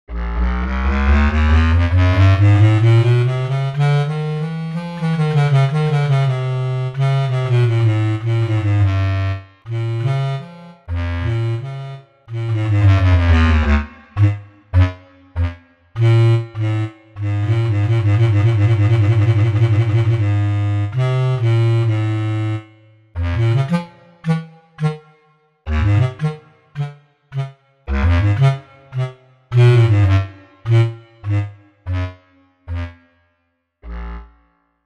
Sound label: Bass clarinet